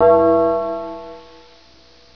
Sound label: BELL